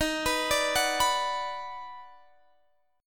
Eb Minor Major 7th Sharp 5th, down, Guitar, Half Step Down